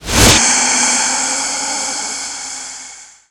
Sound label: dark ritual; weapons; dota; lich